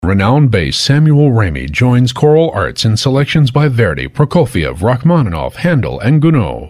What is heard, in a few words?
Classical Bass Singer